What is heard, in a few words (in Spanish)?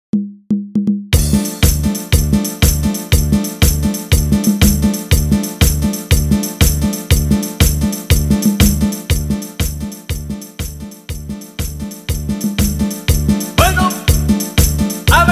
Intro Simple Bpm 120